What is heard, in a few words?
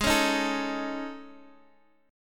A Major 7th Flat 5th